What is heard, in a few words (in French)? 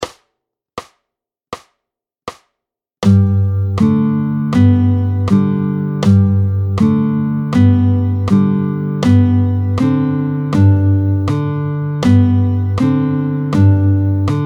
Frere Jacques 80, picking